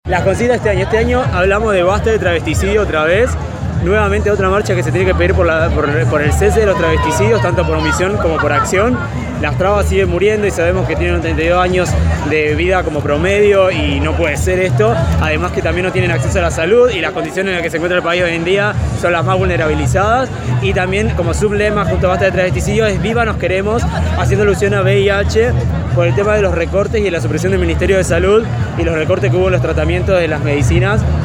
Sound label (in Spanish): marcha orgullo; ROSARIO